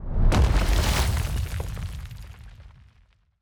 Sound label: Earth Sphere Explosion; TANK; 3Souls